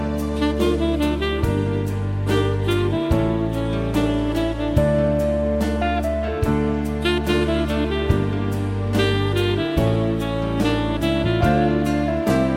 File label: nice sax